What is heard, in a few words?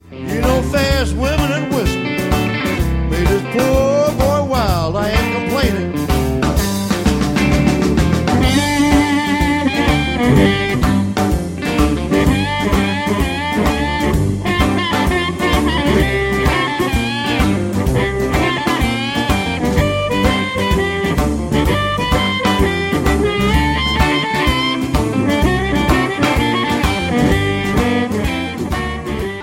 Blues Overtook Me G Harp; 1st Position